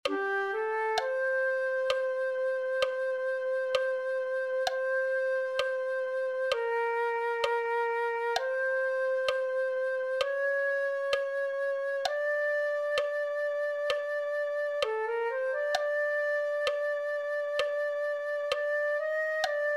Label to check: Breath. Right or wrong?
Wrong